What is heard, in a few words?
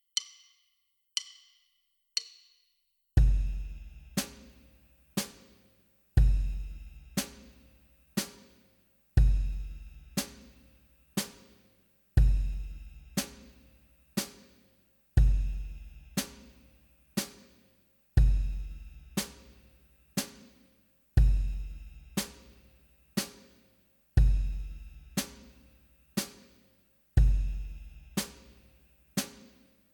Waltz Drum Track 60 bpm